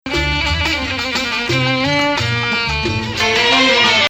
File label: Bayati, maqam